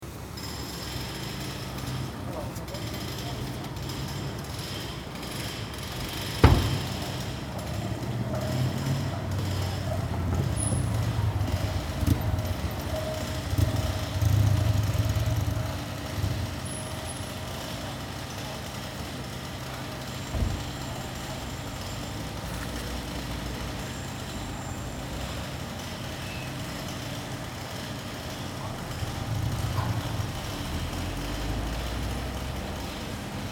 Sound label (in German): Baustelle Atmosphaere